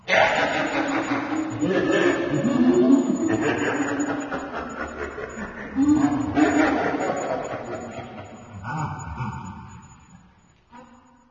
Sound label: soulsand, ambient